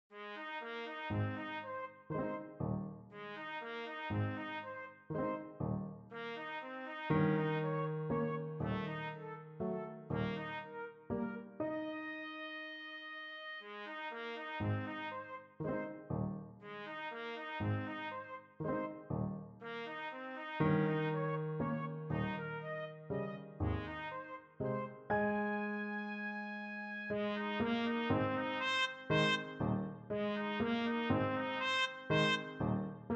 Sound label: valse lente trumpet; delibes